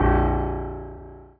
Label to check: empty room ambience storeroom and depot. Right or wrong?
Wrong